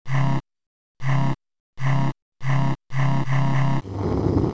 fuse end; dungeonmaster

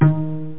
pluck, net instrument; Internet 1996 World Exposition; BrainOpera; net-music

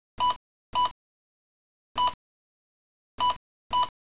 BEEP

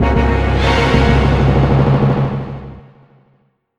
bounced, music; fly

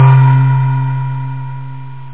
solar bells

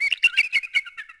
bird; DAoC

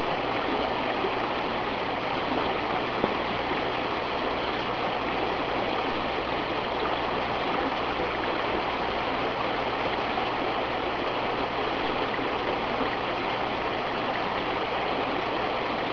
WATER

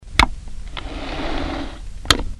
click, office; machine

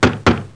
knock

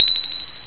ching

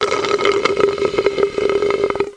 straw; The Pea; SoftKey

